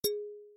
radio Button Balafon Bright

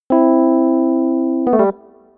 net charge neg; phet-io-wrapper-sonification; build-an-atom

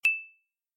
electron Only; build-an-atom; net charge

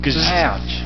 Ouch Smallest; john travoltage

